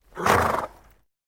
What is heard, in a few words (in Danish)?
hest prust; DYR